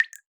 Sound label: Drip; Drips